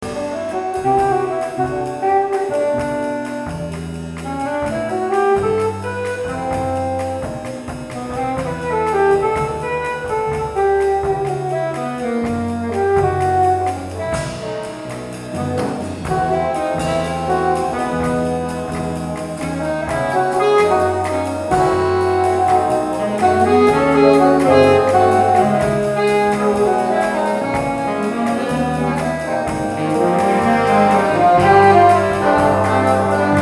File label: big band